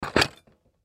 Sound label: Dishes Stack Down, Dishes Stack Set Down; Dishware; Household; Blastwave